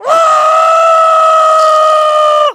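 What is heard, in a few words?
piglet shriek; Bad Piggies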